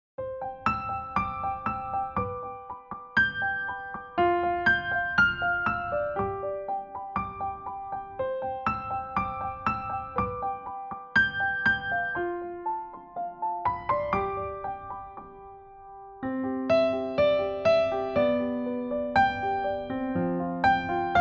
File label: piano